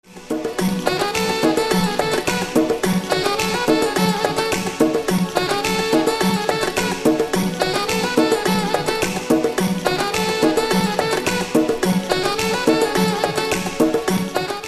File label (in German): Troete Mit Trommel